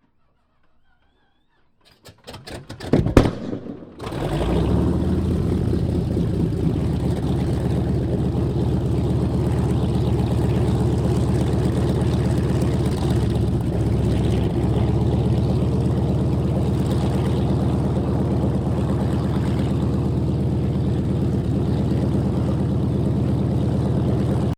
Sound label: AIRCRAFT AT-6D HARVARD MK IIB STARTUP IDLE, Training aircraft; 1942; Airplanes; Vehicles